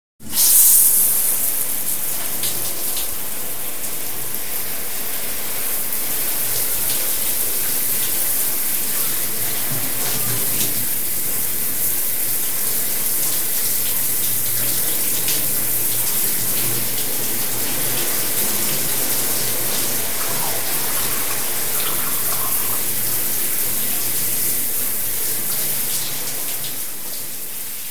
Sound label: Shower Sound